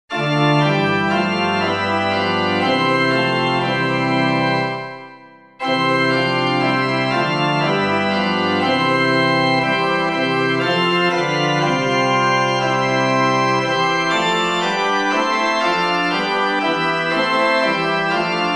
Unto Us a Boy Is Born, PUER NOBIS NASCITUR, organ, hymn